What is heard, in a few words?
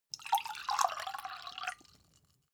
glass pour soft; soundpool